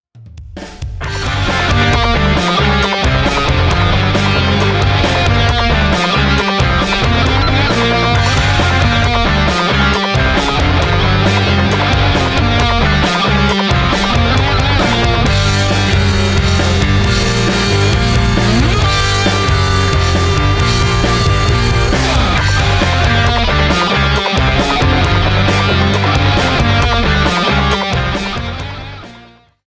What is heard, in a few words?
135bpm remix